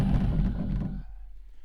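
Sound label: Music Shield Outro 145; From Dust; PS3; Xbox 360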